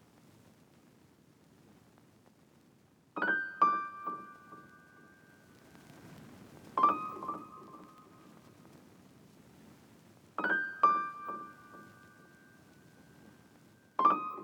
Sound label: Dark Melody Loop - 133 BPM G Min Piano; Cymatics; Oracle Sample Pack